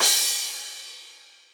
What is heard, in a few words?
crash; osu